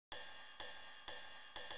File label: tr-8 ride cymbal